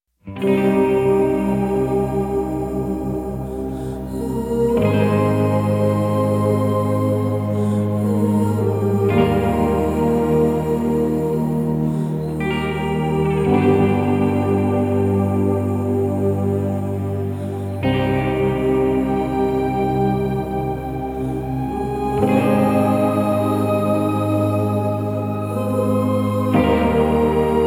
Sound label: Drone; La Femme Piege